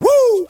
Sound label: "woo", male